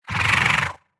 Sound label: CRAWLER idle